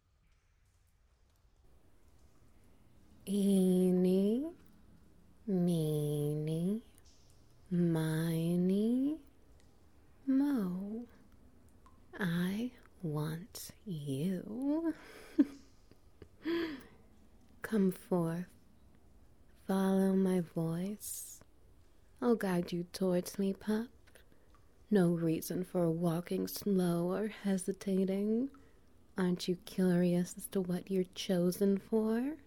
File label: Ambience SFX Wet Noises